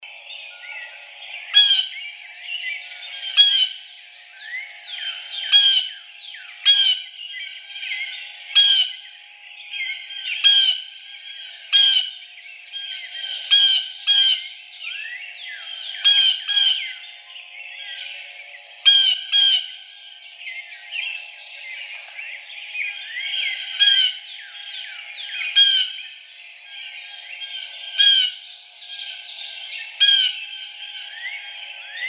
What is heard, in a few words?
Blue Jay